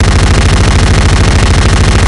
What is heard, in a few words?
gunship fire loop; Source Engine; video game; npc